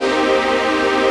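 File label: chord pad, Pads; Best Service; XX Large - Pads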